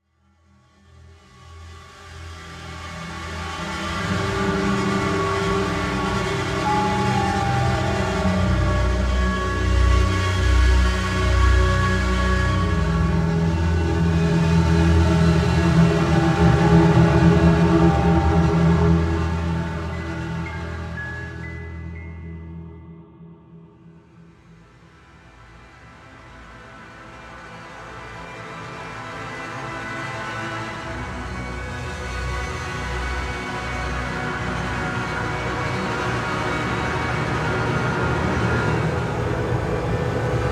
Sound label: Lose Loop; Castle Battles